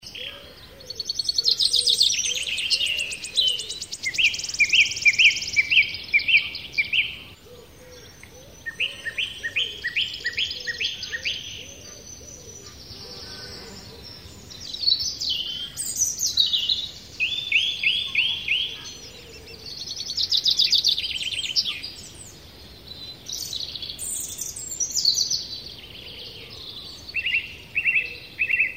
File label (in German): voegel